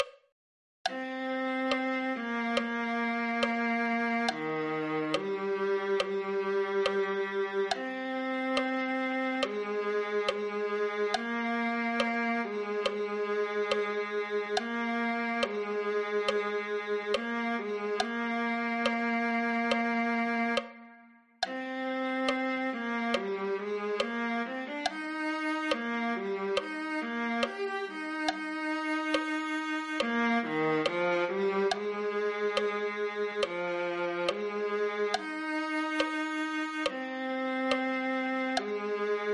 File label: viola